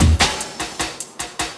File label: LOOP, 150 BPM, Drum & Bass